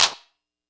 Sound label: kit, Yamaha Motif-ES8